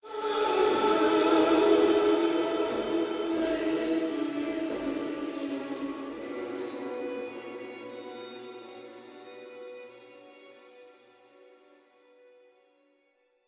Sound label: Ambient Water Music; Aselei